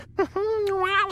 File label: Mmh Woahw